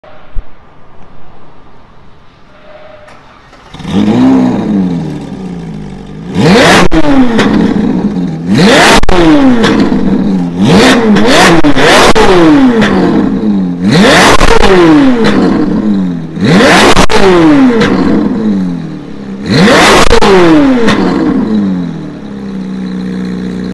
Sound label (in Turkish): egzoz sesi ferrari 458 4.5 v8 570 hp; inanilmaz egzoz sesi; ferrari 458 itaila